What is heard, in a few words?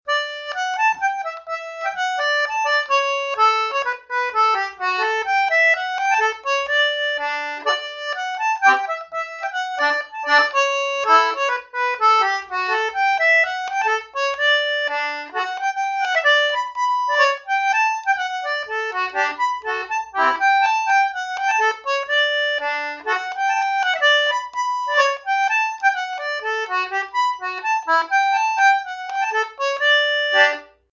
concertina; Chinese; Keelman